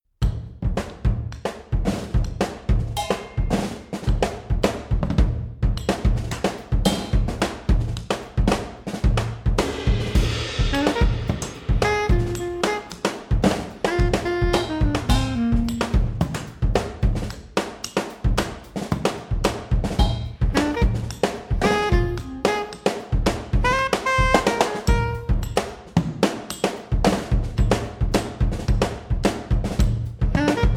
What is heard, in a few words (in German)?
Glocken Spiel